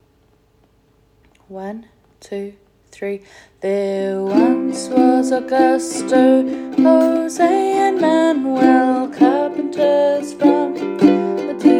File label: Uke the ukulele sea shanty; Uke book